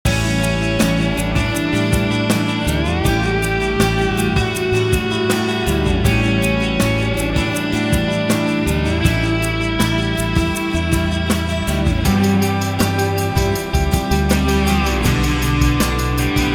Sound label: Glide Medium, Jingles